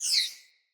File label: Fire Arrow Whiz By